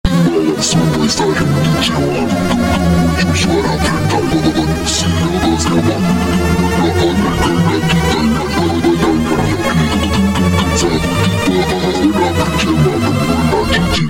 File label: Hardbass ultra Slowed